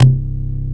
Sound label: Korg CX3, C 1